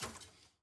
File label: ui generic section microfiche click